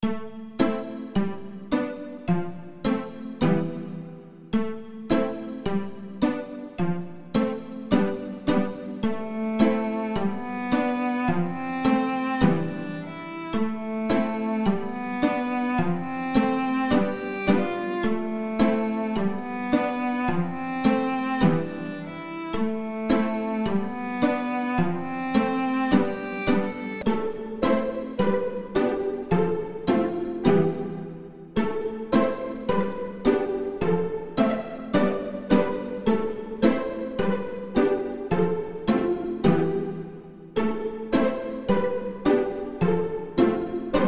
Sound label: Forrest Music; wwise